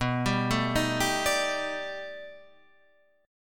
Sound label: B 7th Sharp 5th Sharp 9th, guitar; arpeggiated